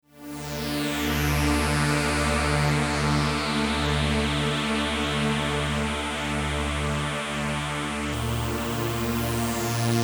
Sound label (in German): String Pad mit Reso Sweep